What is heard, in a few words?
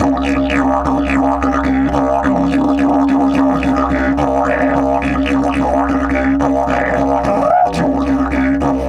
108, Didgeridoo